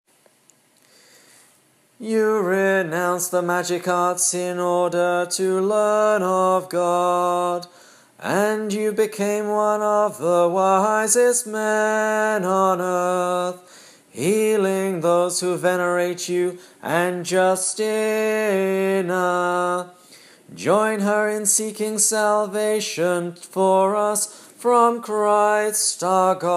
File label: Kontakion; Cyprian